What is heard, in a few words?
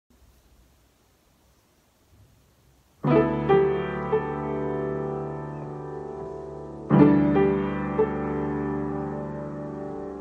Instrumental Track; auditions